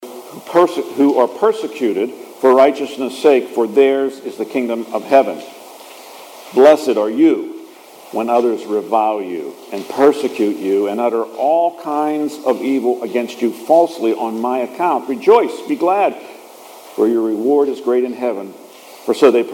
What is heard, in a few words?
Gospel